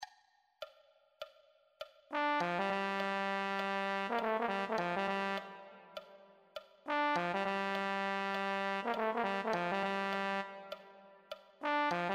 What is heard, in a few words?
euphonium